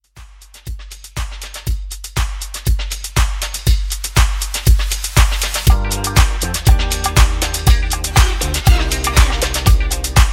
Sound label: 80s RnB Soul Re Drum 120